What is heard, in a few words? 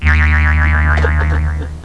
boing